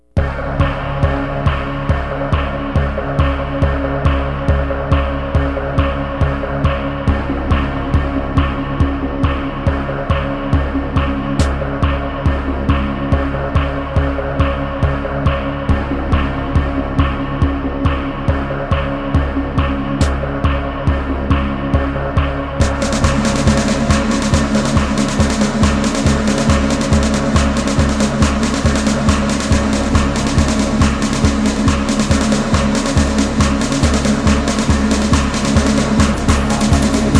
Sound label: suspense escape; ze neon volcanus